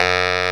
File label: SAX E, Baritone Sax